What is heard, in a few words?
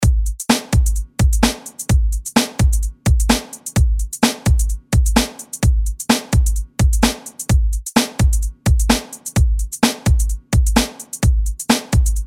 60s re drum 128 Bpm